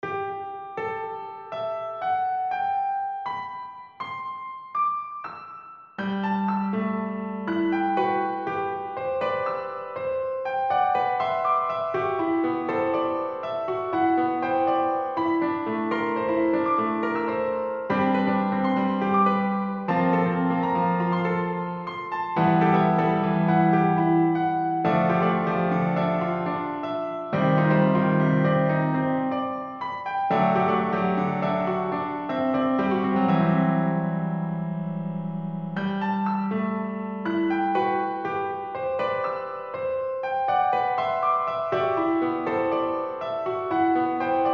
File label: Preludes No. 7 in Em, piano